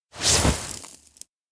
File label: dive into hole upper; anemoi